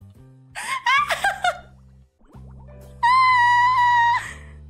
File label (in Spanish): risa de tetera